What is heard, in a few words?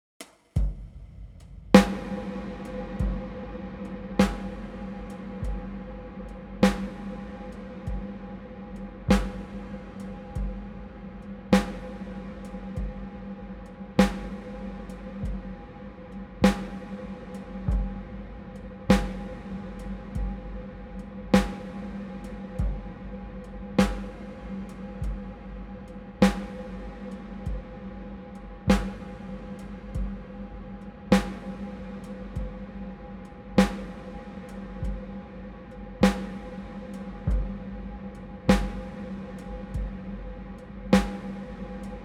Drum; Blackhole iOS